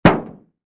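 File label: Car Hood Hit Sledge Hammer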